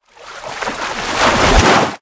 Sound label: lobby defeat; Smite; Sol